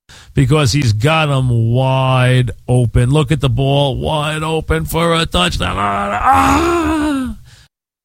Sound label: Touchdown AHHHH; WFAN